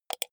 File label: computer mouse wheel click fast